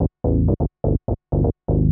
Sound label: SNTH BASS HOUSE 125 A; eJay